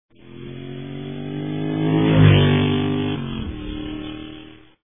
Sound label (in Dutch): racen, moto; geluidsfragmenten